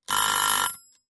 budilnik

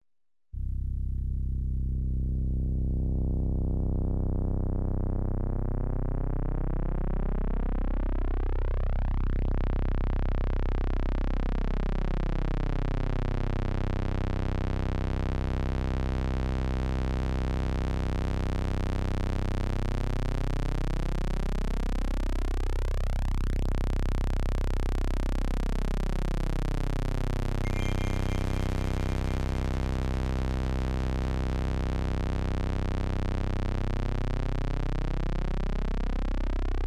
sintezator melodiya razmishleniya medlennij napryazhennij temp

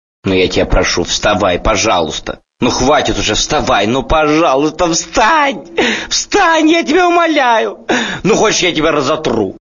melodiya na budilnik "vstavaj pozhalujsta"

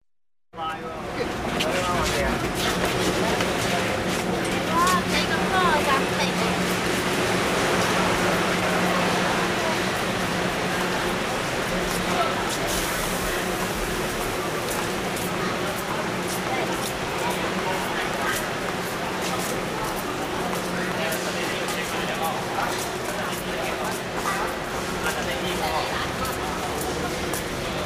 kitaj zheleznodorozhnij vokzal

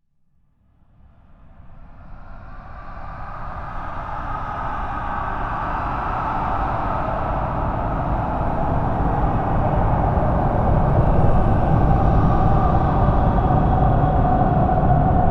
krik cheloveka s effektom reverberacii

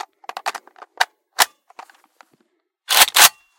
bistraya perezaryadka oruzhiya

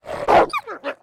layanie sobaki u kotoroj bolit lapka

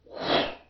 effekt vaush kotorij ya proizvel rtom nemnogo zamedlilsya

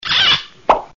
multyashnii zvonkii

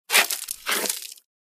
zvukovoj effekt nosheniya obuvi potirayushej gravijnij pol

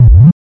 poedanie robota

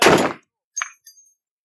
vistrel iz 50 bmg na otkritom poligone snaryad popal v zemlyu

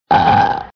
otrizhka aktivnaya

